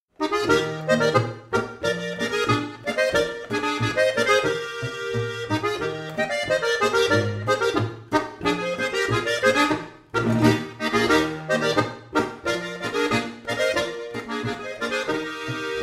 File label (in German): volksmusikstueckl